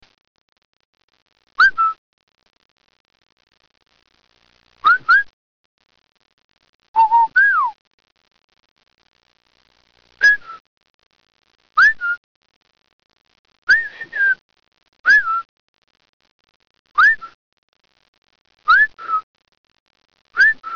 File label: Whistling